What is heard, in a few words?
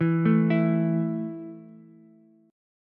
A 5th A down Ukulele; arpeggiated